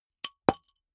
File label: flip-top bottle open unseal; berufe